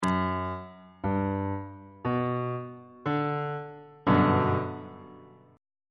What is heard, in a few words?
a double flat major 7th 3rd inversion on bass clef